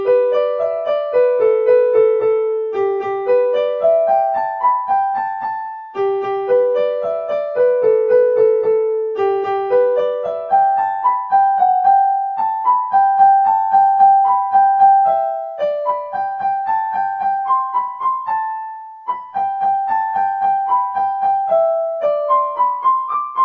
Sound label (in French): courant d'Eire